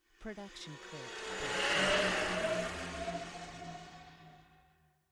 star cruiser flying; soundscrate

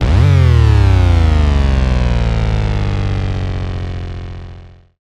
Bass Drop; SoundsCrate

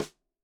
snare rimshot

